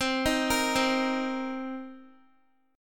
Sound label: C Minor 7th C Guitar; Cm7 arpeggiated